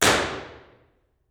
Lecture Hall, Reverb; 40x70x30ft; 40ft; FL Studio 9; Fruity; Edison

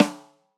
Snare, drums; Ableton Live 12 Lite Core Library

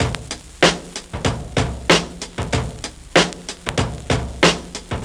Break Round 95 bpm, Drums; Loops; Ableton Live 12 Lite; Core Library

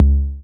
Tom Mid Analog, Drums

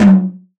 Tom, Drums; One Shots

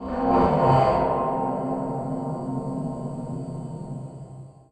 Dive Bomb VO DISTANT; MountainGuardian; Journey

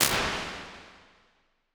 Plates Modern Echo Plate L; Impulse Responses; Ableton Live 12 Lite

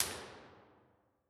Real Places Silo Waiting Hall; Impulse Responses; Ableton Live 12 Lite; Hybrid

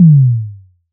Tom MG1 Fat, Drums

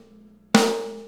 110bpm Snare; Sony; ACID Pro 7.0

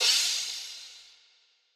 Crash Build, Cymbal; One Shots; Drums; Ableton Live 12 Lite; Core Library

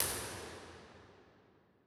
Impulse Responses, Bigger Spaces R; Crusades; Ableton Live 12 Lite

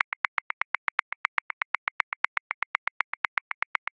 Calypso Accent 16ths, Grooves; Ableton Live 12 Lite; Core Library

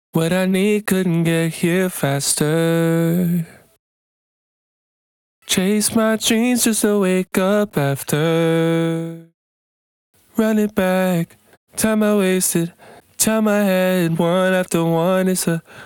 Vocal Main; Loops; Tonal; Ableton Live 12 Lite; Core Library

